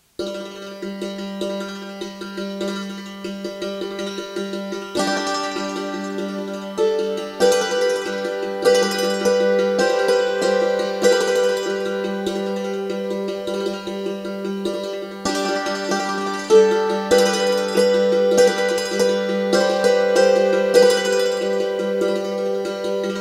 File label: drummer